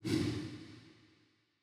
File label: bet Level Change; netent